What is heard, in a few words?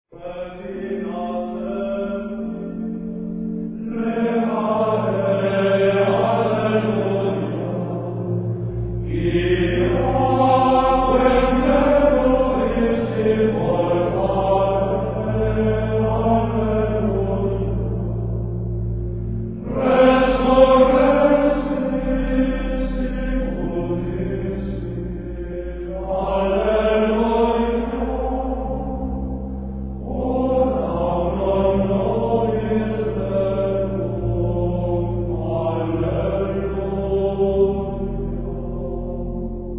Regina Caeli Simple Tone